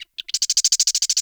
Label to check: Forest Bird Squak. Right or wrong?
Right